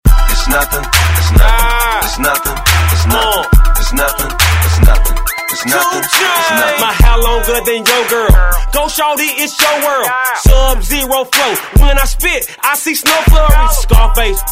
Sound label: HIP HOP